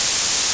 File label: Xite Noise; Proteus 2000; Digital Sound Factory Proteus 2000